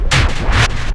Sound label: scifi, SCIFI & SWEEPS; Techno Trance Essentials